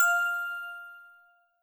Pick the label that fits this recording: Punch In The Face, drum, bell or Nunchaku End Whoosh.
bell